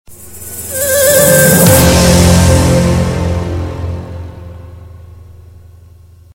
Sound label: Rocker Sms